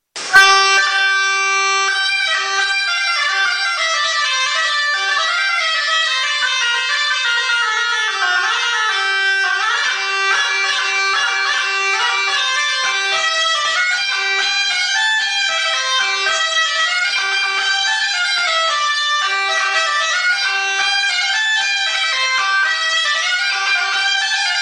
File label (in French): instruments cabrette